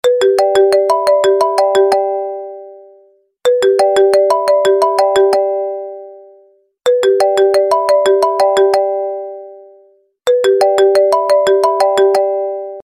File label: Ring; Apple